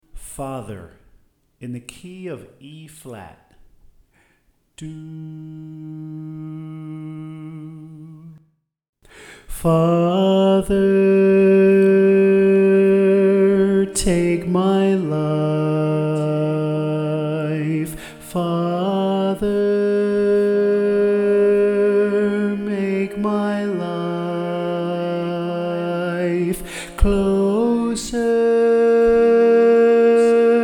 Bass

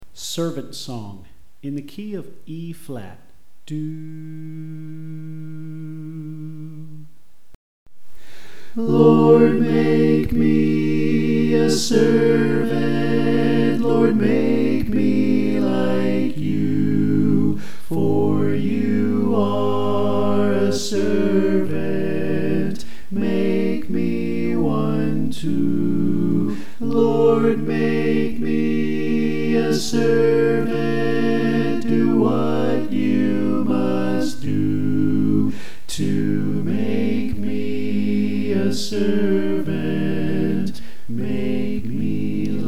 Equal Mix

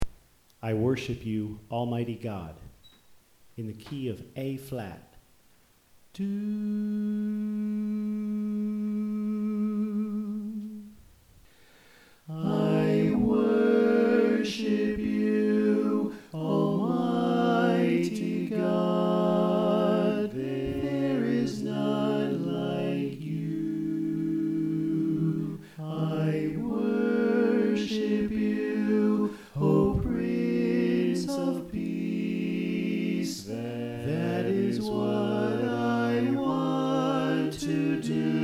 Soprano Left

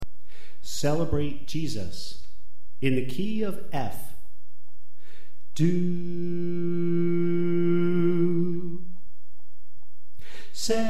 Soprano

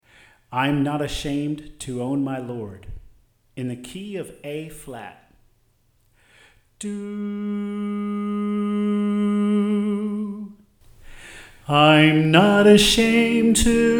Soprano

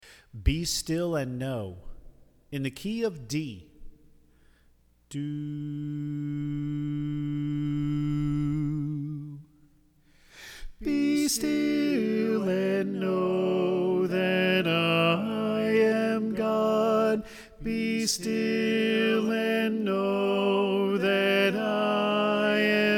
Tenor

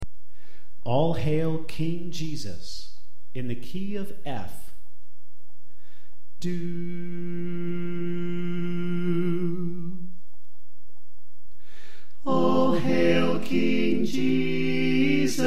Alto Left

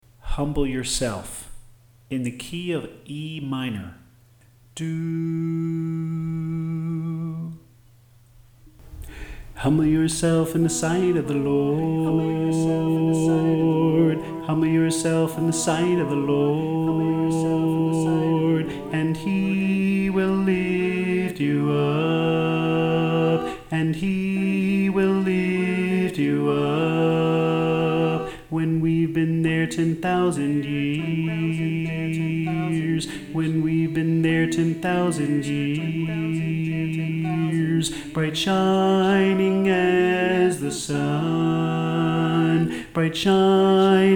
Bass

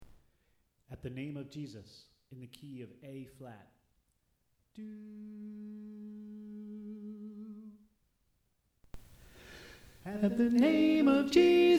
Tenor

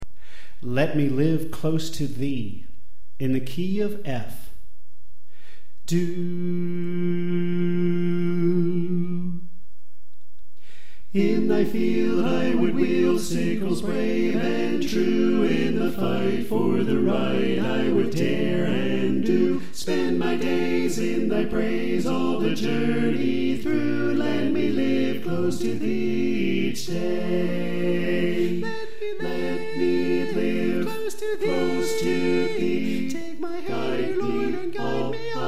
Tenor Left

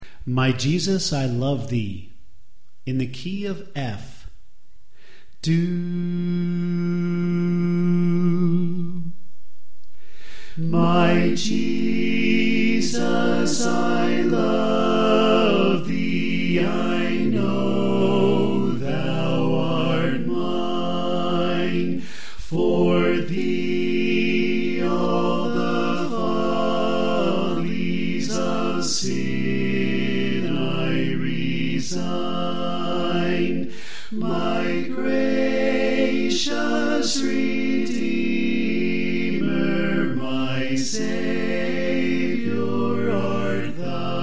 Tenor Left

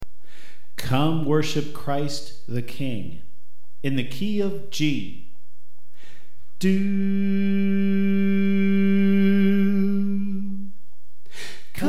Alto

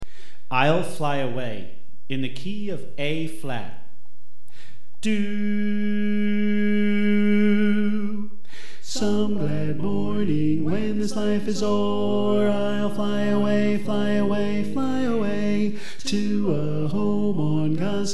Tenor

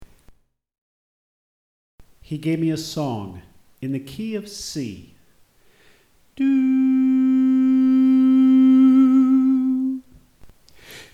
Tenor Left

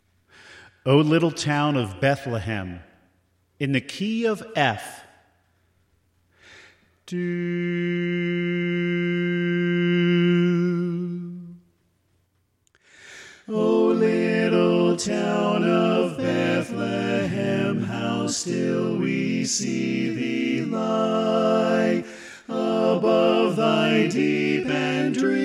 Tenor Left

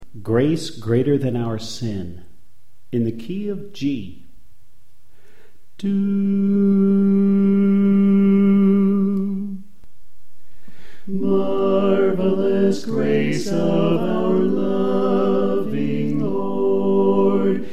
Soprano Left

